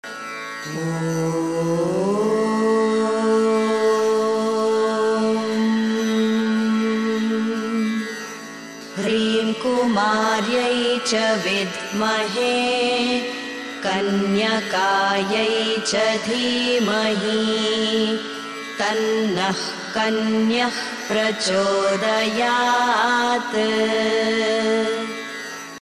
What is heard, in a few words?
kanyaka paramameshwari gayatri